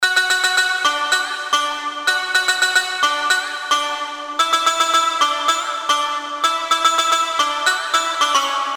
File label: Just Melody